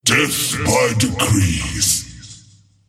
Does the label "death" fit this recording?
Yes